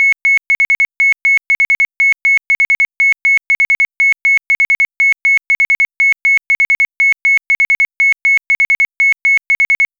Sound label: Tone